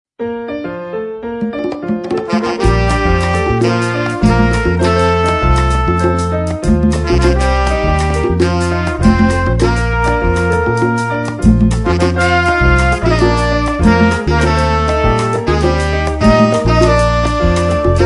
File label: Instrumental, Salsa Version